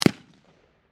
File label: Gun Machine Gun, Gun, Machine, Gun, .223, Caliber, Single, Shot, Distant, 100, Feet, Towards, Bushmaster, Ar-15; Warfare; Machine Gun Sound Effects; BlastWave